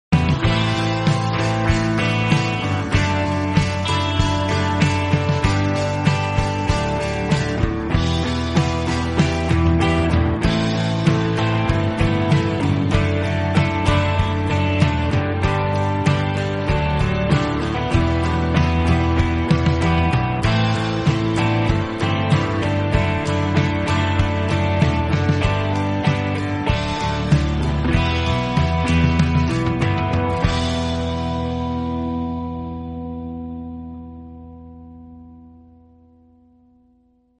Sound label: Instrumental